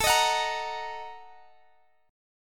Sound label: Bb-Major 7th Suspended 4th Sharp 5th